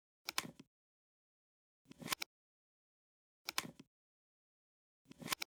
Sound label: percussion